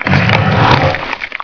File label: scare sound